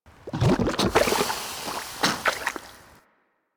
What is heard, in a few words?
Landscape Coolant Bubble; ambience; props; one offs; Warframe; Venus Open World